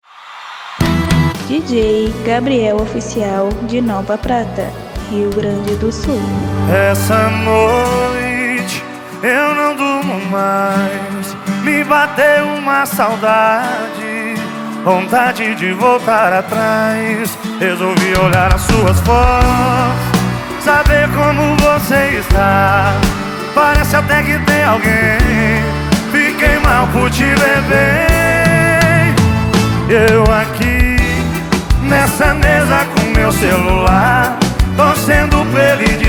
sertanejo

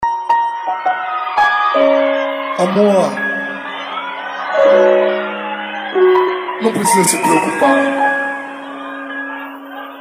eletrofunk; cd equipe revoada especial fim de ano

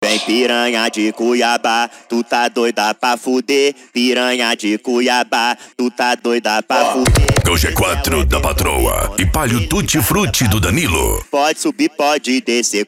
funk mega bass e flash back

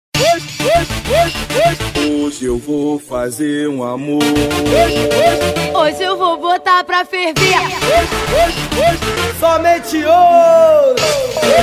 eletro funk; cd na batida do eletro funk

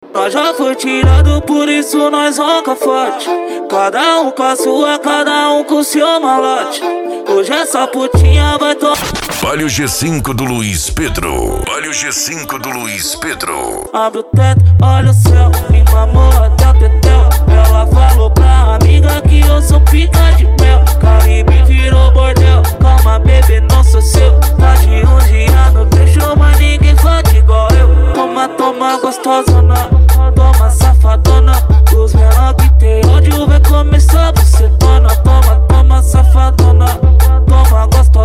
funk mega bass e forro; urupa ro

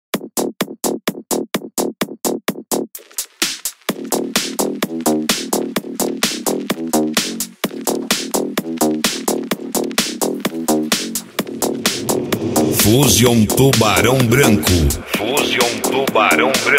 mega funk minimal do baile

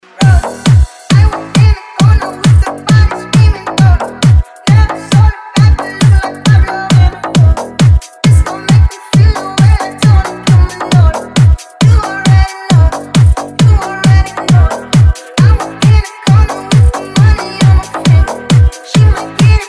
esp de pancada

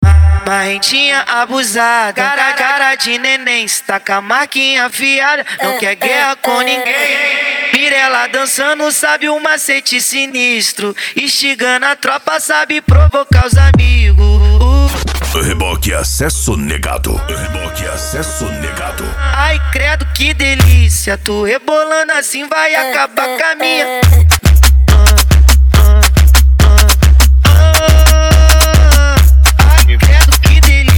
racha eletronica e funk mega bass

